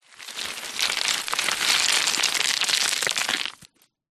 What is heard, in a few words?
foley stones rocks small landslide fall down